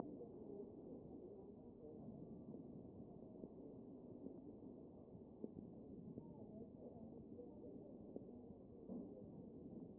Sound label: A CHEST